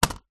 technology computer keyboard bluetooth small down on desk